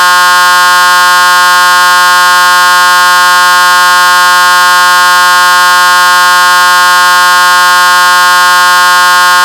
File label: HORN, siren